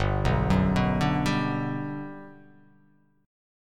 G Major 7th, G, down

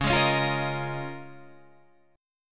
Db 6th Add 9th Db, down, Guitar Open D

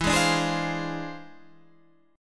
E 7th Flat 9th Sharp 5th; E7b9#5; strummed

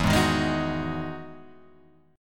E Major 9th E down Guitar Open G

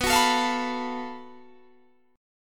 F sharp Minor Major 7th Double Flat 5th, guitar

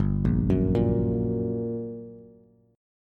G Minor Major 7th, down, Bass; GmM7 arpeggiated; JGuitar

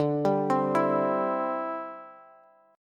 D 7th Suspended 2nd Suspended 4th D, Bass; D7sus2sus4 arpeggiated

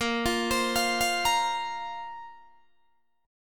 A sharp Suspended 2nd down Guitar DADGAD

